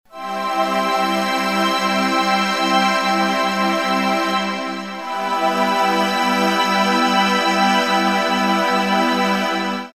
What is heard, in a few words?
Kawai K4 Strings